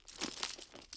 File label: Commando Belt Rattle; Foley; Commando walking elements